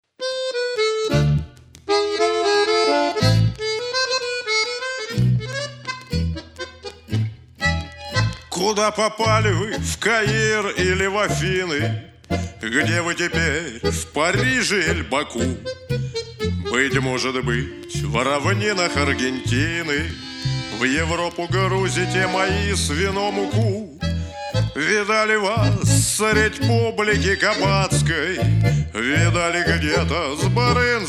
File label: tango